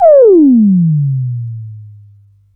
jam synth, E keys

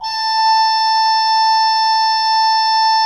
HGRP A4, Cathedral Organ, HOLZGEDACKT; Propeller Island

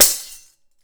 DAF HIT; AKAI S6000; WorldSounds

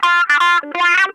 TIMER, Funk Guitar; Zero G - Funk Guitar

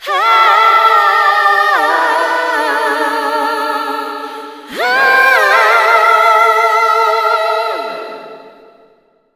AHAHAAHA, ADLIB WOM, Funky Vocals; USB Soundscan vol.18

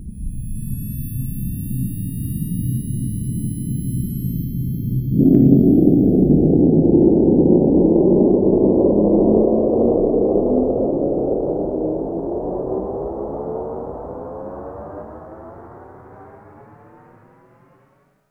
JET FX

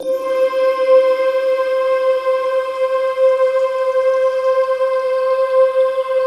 RAIN, Rainstick, Rain Forest; Optical Media International; Sonic Images Library

